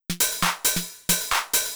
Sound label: Drum Loop; acid loops; Wiretripn